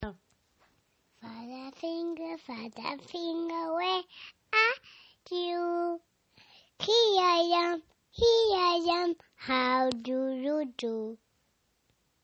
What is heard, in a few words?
song